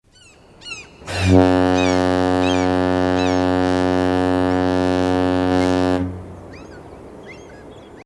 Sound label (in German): Schiffshorn